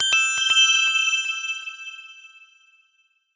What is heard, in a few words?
Bells